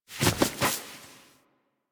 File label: Foley Idle Alert Ear Scratch Fast; Enemies; Kubrow; Warframe